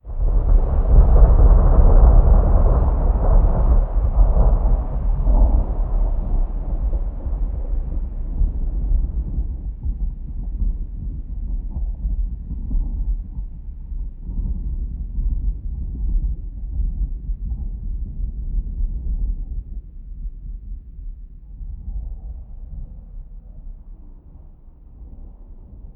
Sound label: Thunder Distant